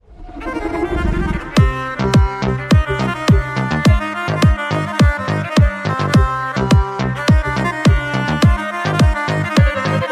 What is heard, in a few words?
violin ringtone; android; rolex